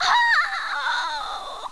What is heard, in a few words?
f die